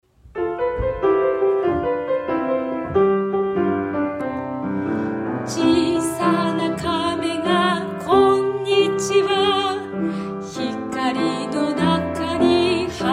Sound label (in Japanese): ちいさなかめがピアノ